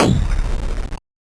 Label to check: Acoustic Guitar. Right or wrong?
Wrong